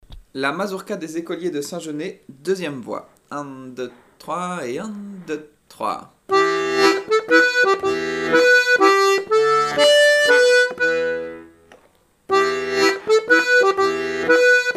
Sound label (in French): mazurka 2e voix